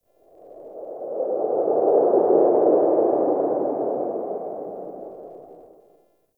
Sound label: Forest Cave Howl; Ambience; Warframe; Grineer